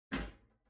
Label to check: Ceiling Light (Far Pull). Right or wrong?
Right